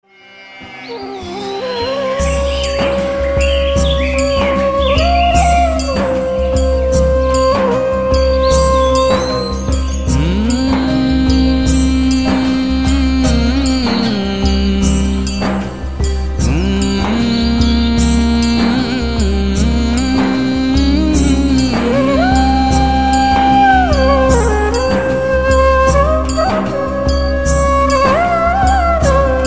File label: Flute Trending Bhajan